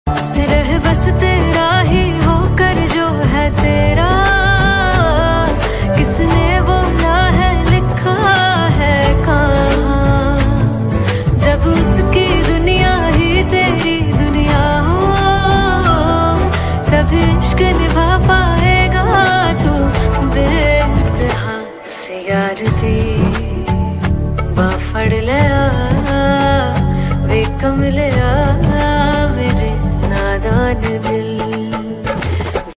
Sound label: song female version